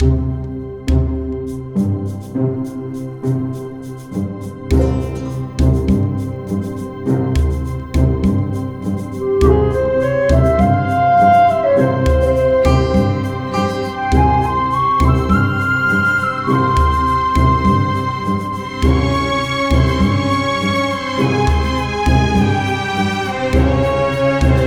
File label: Desert Loop; Eternal Radiance